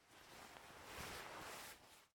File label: Player Door Open; Game rip; MiSide